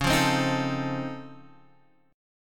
C sharp Augmented Major 9th, C sharp, down, Guitar, DADGAD